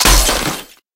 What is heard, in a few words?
hit; eva